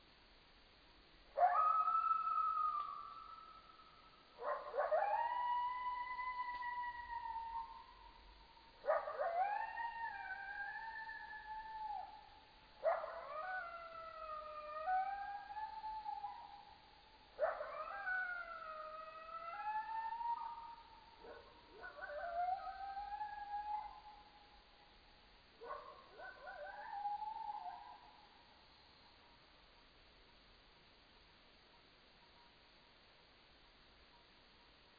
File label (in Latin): Canis latrans; Ecología del Paisaje Sonoro